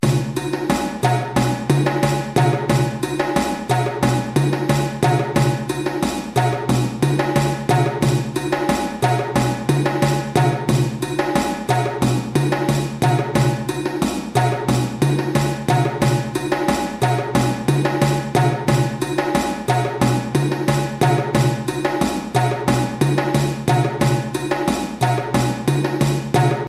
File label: Moribayassa Groove 180 bpm, djembe